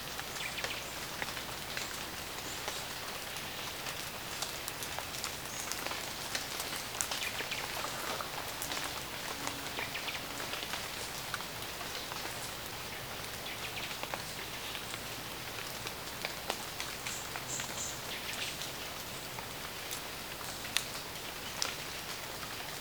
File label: jungle rain; canopy2